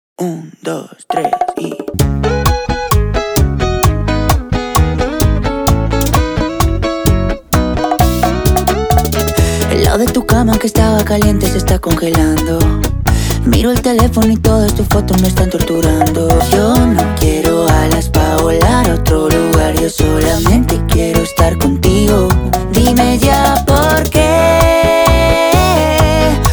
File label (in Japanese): バチャータ初級 課題曲